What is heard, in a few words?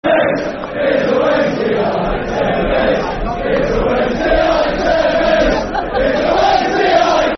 Tevez Is A Wedsnesdayite, Football Chants (Soccer Songs); Sheffield Wednesday Swfc